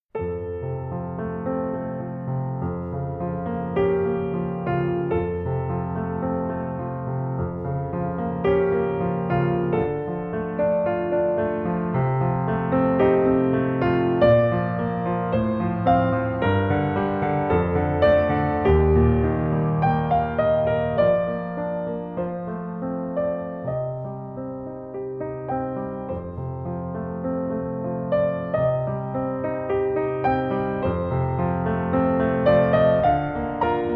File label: pop preludes consolation